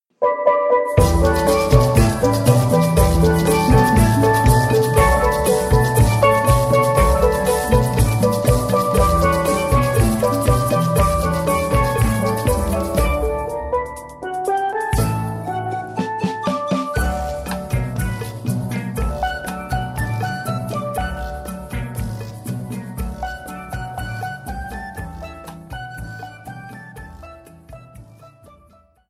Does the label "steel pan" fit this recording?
Yes